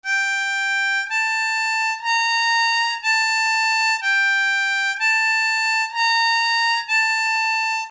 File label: Overblow, harmonica